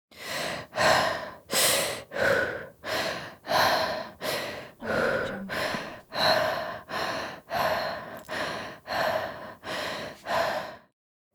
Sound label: female breath heavy